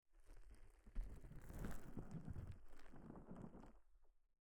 膝枕; 3DIO; 耳かきに関する音

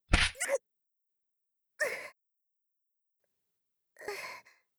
うぐ

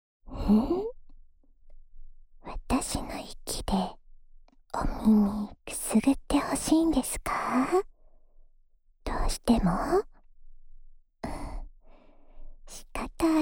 安眠用耳ふーふー（左耳）, ASMR

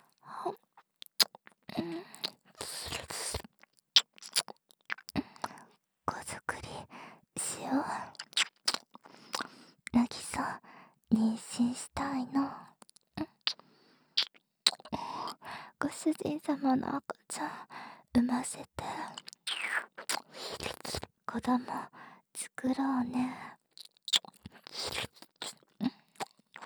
子作りして♪ 耳舐め

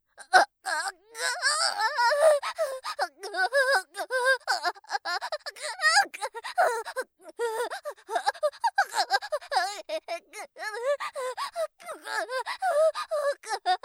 陣痛 ボイス

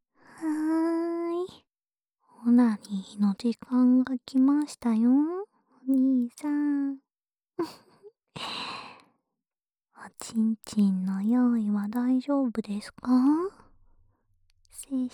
オナサポ用耳舐めボイス, ASMR

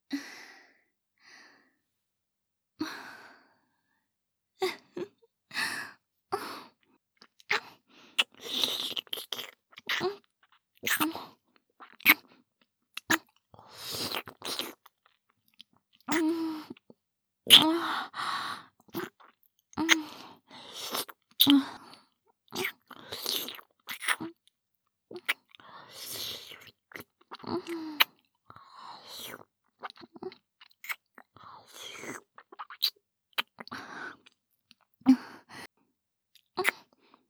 キス音

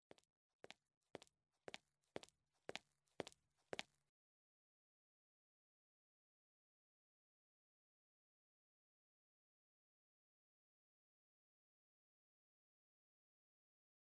特別サービスの耳かきと囁き（左耳）, ASMR

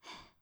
ブレスミディアム

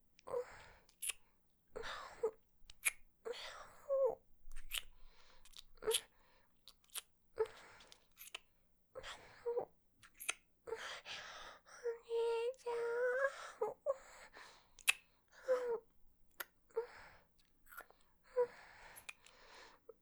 キス 2 (ノーマルキス); 純真無垢な妹の無邪気なゆ～わく

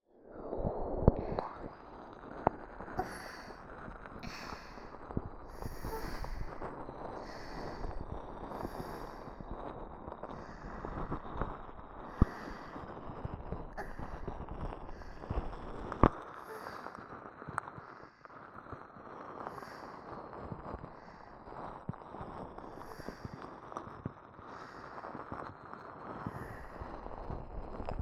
癒し お耳のオイルマッサージ 優しめ; 癒しの音 詰め合わせ♪

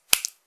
ぱちゅ音単発, 激しめ

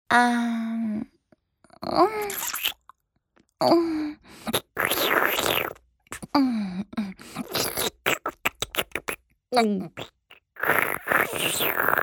フェラチオ(弱)：頭を前後にゆっくり往復して陰茎をしゃぶる; 実用ボイス抜粋集

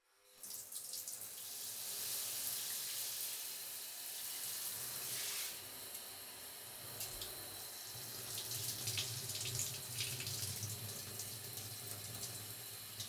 自分 シャワー で 髪 を 流す; 3DIO; お風呂に関する音

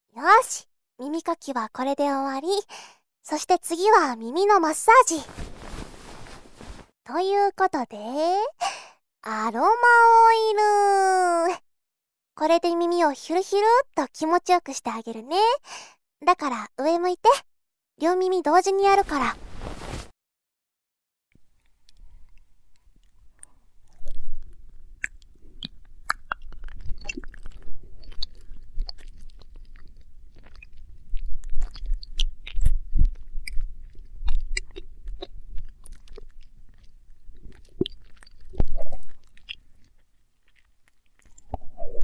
耳のマッサージ(両耳), ASMR

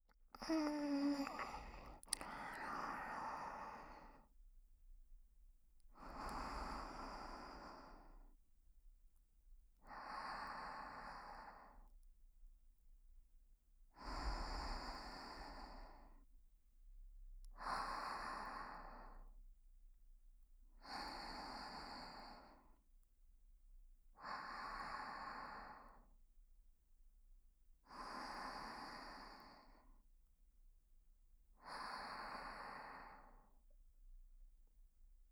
寝息 姉

